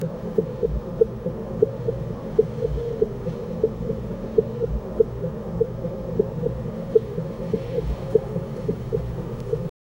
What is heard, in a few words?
human body